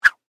Whip Whoosh Small, Dowel, Rod; Foley

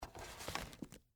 Bible Pick Up, Book; Foley; Books; Offices; Blast Wave

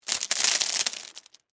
Crash Stones Debris, Crash, Stones, Debris, Spill; Rocks; Materials; BlastWave

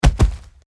Impact Body Fall Impact; Wrestling and Struggling; Combat

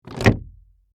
Door Latch Open, Foley, Wood, Door, Latch, Open; Latch Sound Effects; Latches; Objects

